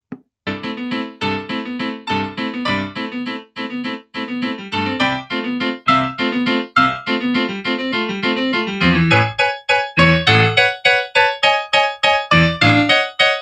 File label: worst pies accompaniment